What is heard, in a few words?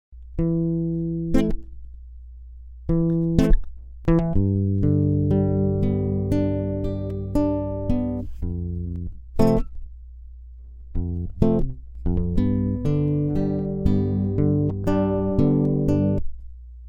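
lead, Guitar 2 (accompany)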